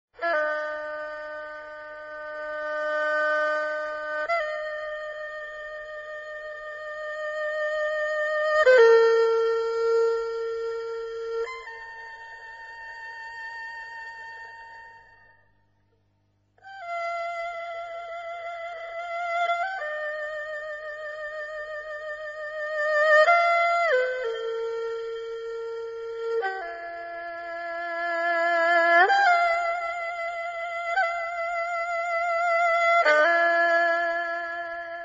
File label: erhu birds singing in empty hills; kongshanniaoyu